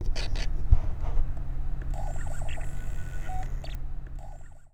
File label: SPIN DISK; airport